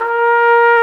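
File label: BRS TRMP, Brass Trumpet Solo, Bach; Roland L-CD702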